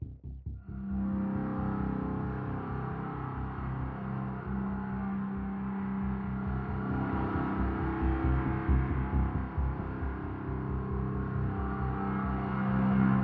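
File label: Music Ambient Tension; Hunted: The Demon's Forge; x360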